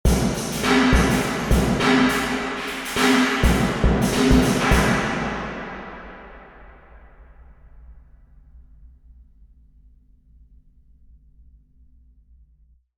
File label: marble hall drum; elveden hall suffolk england